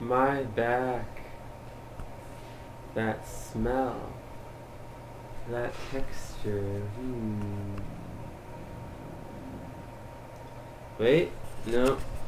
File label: cicada awakening